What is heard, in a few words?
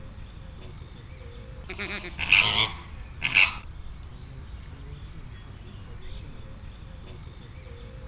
flamingo, chileiflamingo; veszpremi zoo 2013; rozsas flamingo; fr2 leonagy